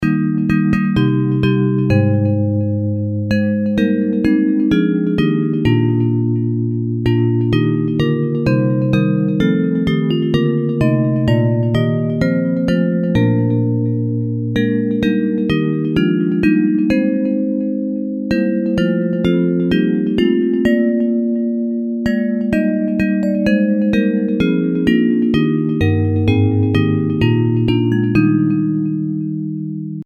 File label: Bells; Hymns